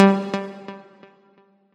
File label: combo; quatuor